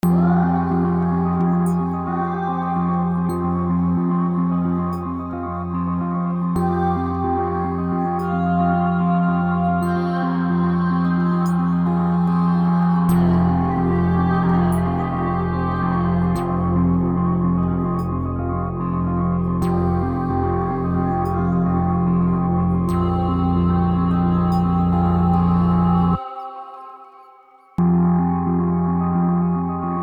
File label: 147 BPM, Future, Ambient, Trap; Nucluer Fm; IamSynthetic